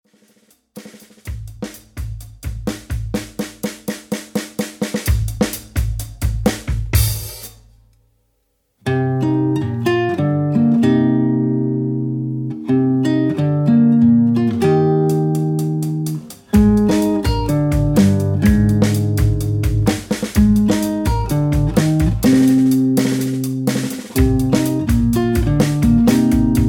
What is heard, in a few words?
Drum Git